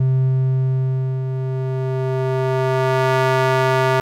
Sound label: triangle clipped